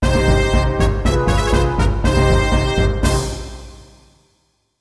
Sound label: Entering City Jingle (arabic style)